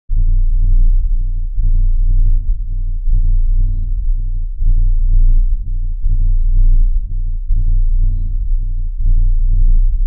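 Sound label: Low Pulse Scifi Throb, spaceship fx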